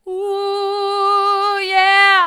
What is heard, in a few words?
ooh yeah mid, vocal